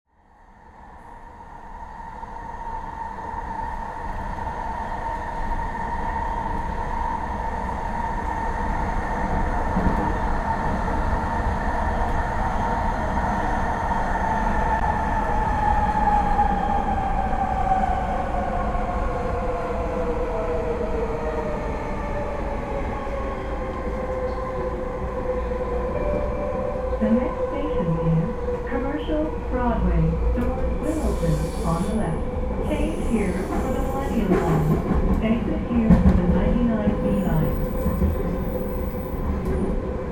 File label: noisy ride on SkyTrain, Vancouver, Canada